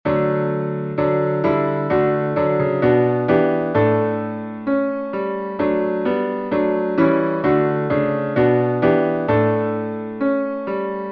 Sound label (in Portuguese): salmo instrumental